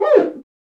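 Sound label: percussion quica; Northstar - Drumscapes Roland; Bonus Perc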